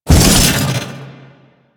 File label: Jump; Warframe; DE; Sentients; Erra; Foley